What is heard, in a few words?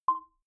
button keypad tonal dirty; signale